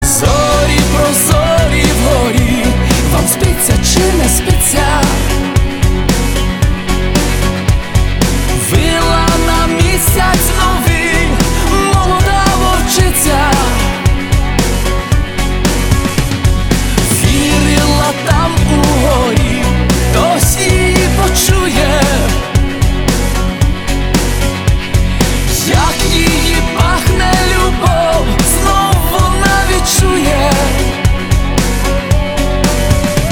hint music wooooooo

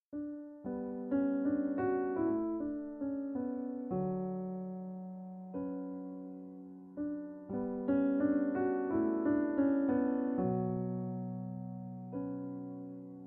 piano

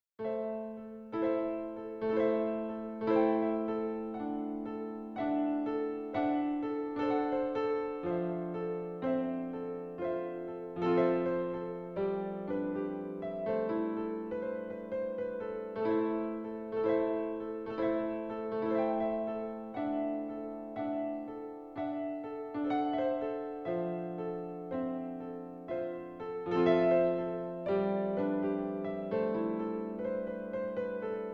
piano

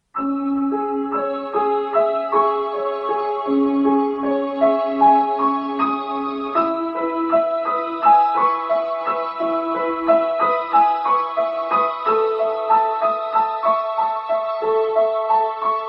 piano

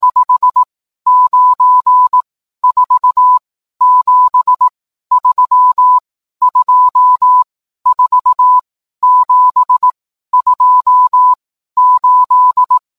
morse

